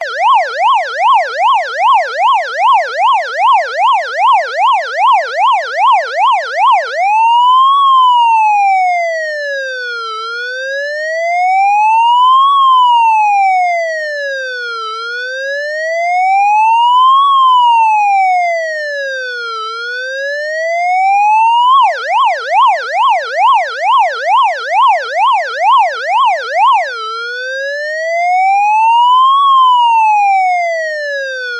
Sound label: emergency police siren isolated clean; zapsplat